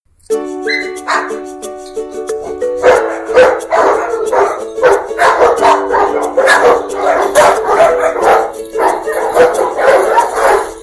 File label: ukalele dogs